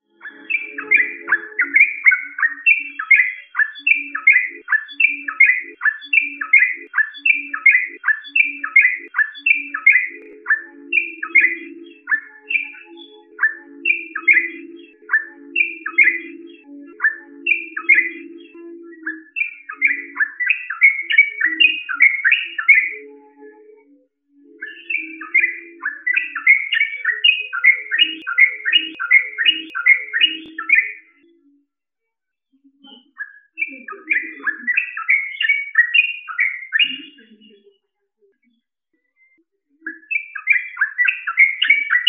trucukan ropel

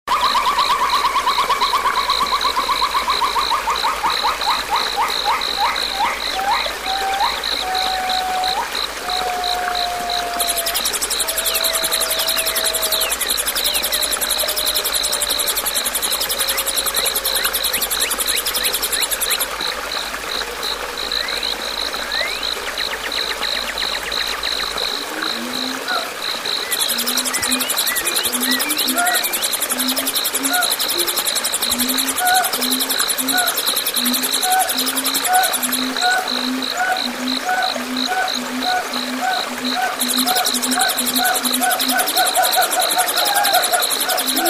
terapi murai batu; kreasi om ct; versi asli

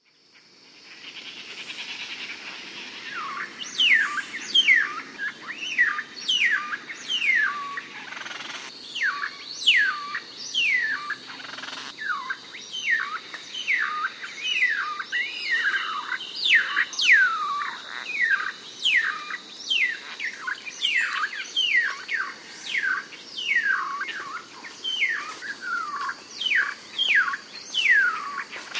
suara satin bowerbird

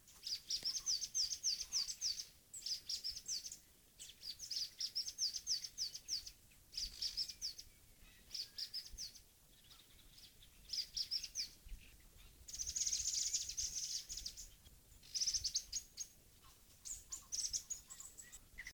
jingjing batu

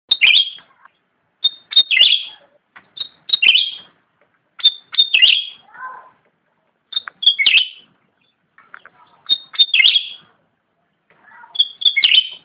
suara burung cucak rante untuk pancingan

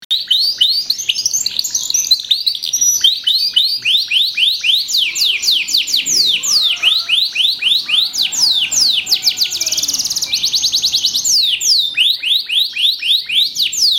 audio kenari liontin

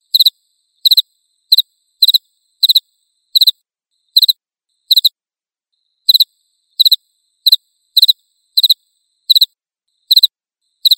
jangkrik

